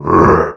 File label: sun super jump; phraxathity